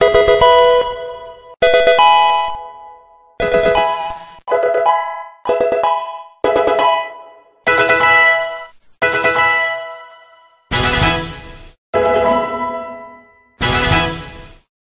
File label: Level Up; infinitefusion